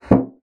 bassoon slap; Xsample VOL-6; C BASSOON

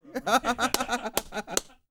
LAUGHS; Spectrasonics; Vocal Planet; Jazz FX

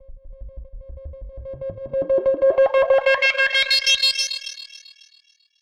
Filter Proc B 110, alarm siren samples, 110bpm; musicradar

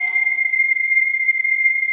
PAD, Dance Mega Synth

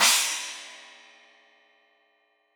China, Crash, China Cymbal

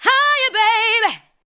ADLIBS

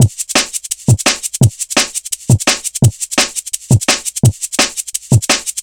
Drumloops, 170bpm; Zero-G; Total Drum Bass

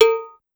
Cowbell Heavy, Clavia DDrum-AT, Pitched Down

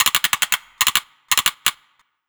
gun toys; USB Soundscan vol.27

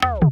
tape fx, scratch; Ambient Grooves

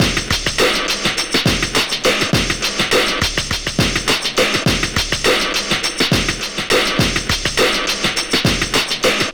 Warehouse Loop, Drum Loops, Distorted; Zero-G Smoke

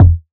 Big Tub, household rhythm samples Hits

